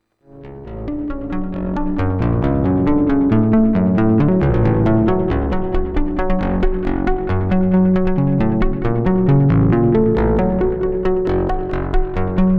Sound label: Karplus Strong Tap Hold Accents; StarLab